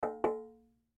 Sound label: double tap